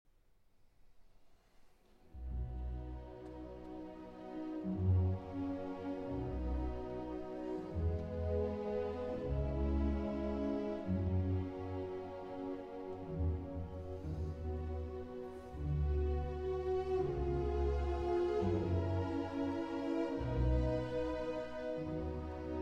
Piano Concerto in E Flat Major, Adagio un Poco Mosso; Piano Concerto No. 5; Op. 73 Emperor